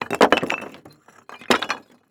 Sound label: Crash Brick On Pavement; crashes; AdobeCC